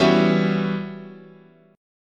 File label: E-Minor 6th Add 9th, chord, guitar